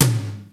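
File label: Power tom, Roland JD-990; Drum Samples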